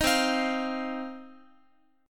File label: C Minor Double Flat 5th C down Guitar 4A 4E 5A 5D 5G sharp 6C sharp; Cmbb5 strummed; JGuitar